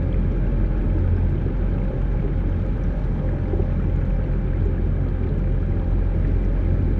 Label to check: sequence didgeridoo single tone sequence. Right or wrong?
Wrong